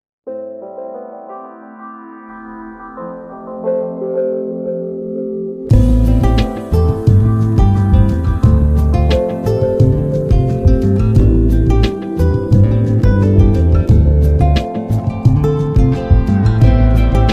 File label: Moody Groove